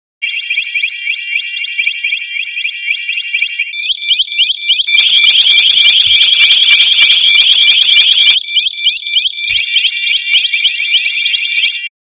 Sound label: alarm buzz